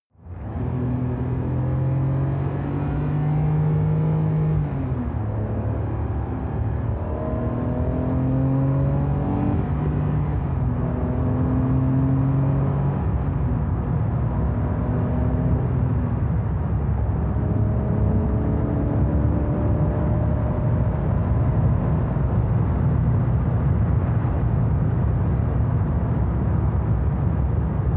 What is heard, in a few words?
sports car int engine drive accelerate all gears; verkehr-transport